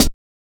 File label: Hat, Metallic Stepz, drum loop hits; Zero-G Jungle Warfare 1, 2 & 3; Percussion Hits